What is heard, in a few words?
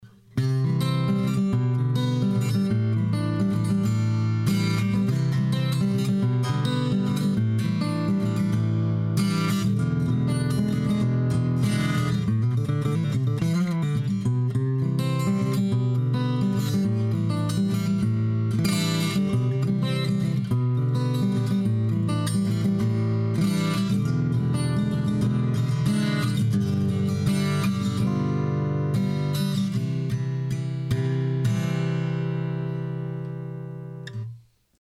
AGit PreAmp EQ Comp